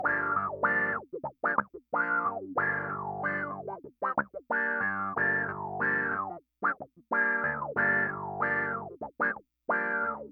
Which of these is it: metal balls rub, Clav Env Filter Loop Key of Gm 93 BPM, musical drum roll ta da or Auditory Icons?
Clav Env Filter Loop Key of Gm 93 BPM